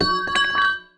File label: cannon shell drop